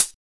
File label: Closed HH